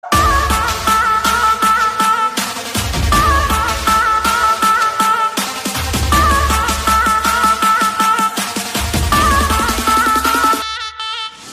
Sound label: music; Commando 3